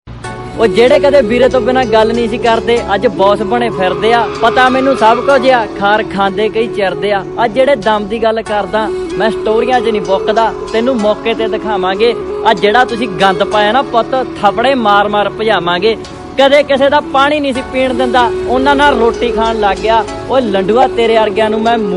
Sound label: viral bgm; tik tok